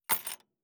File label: Dropping Large Spinners In Metal Tackle Box; Badlands Sound; Fishing Tackle Box